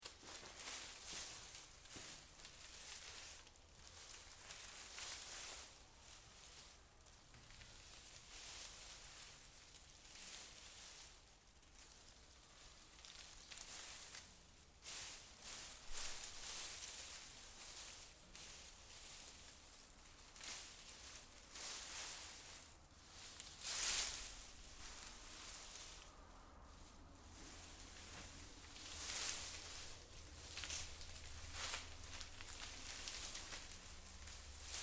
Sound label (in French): manip herbes seches